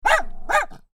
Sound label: dog barking small; Dogs; Animals